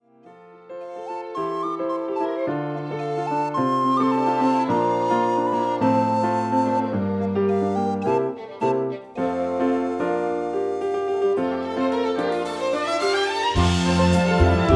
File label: Instrumental